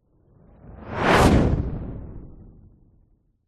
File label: warfare missile rocket pass flames booster